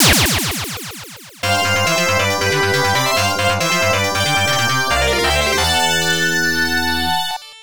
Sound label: Coin In BGM; Cross Counter; The Final Round